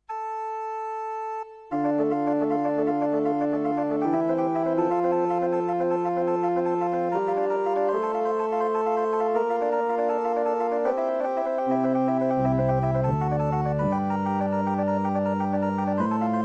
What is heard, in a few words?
Amen, displays a set of church organ patches I built on the 05R/W; Korg 05R/W